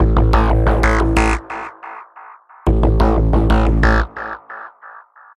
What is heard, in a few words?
dark side tone